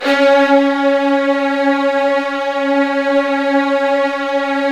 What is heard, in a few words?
Strings C#2 R; Virtuoso